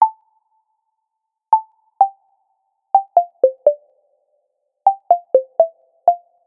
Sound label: Samsung Tune